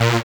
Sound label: SYN DANCE; Sound & Vision - Gigapack II